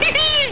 hee hee

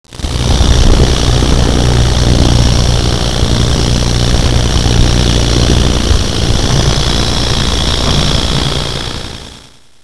rattle; Discovering Snakes in Louisiana; LA Snakes

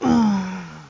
hero hit; alienbreed3d2

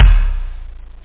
room kick; kicks

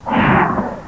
dragon fling block; kungfu

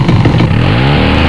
chain, weapons; chaos

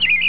backgnd bird; “Tweety” Sounds; Ambiance

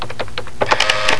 add machine short; Hyper Stacks; Pacific HiTech; Science; Chemistry; Thermo

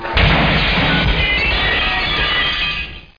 BREAKING; GTIDESK

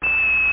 Space Ding; Acorn on the Moon

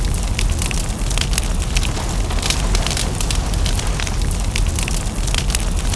amb fire loop; Spearhead Demo; MacFormat 2003 June

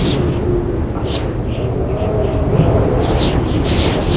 crane wind loop; SpecOps2

